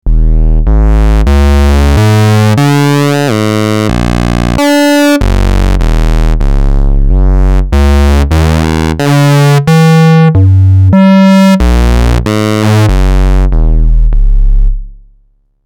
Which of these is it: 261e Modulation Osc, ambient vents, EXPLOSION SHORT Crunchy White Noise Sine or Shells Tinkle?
261e Modulation Osc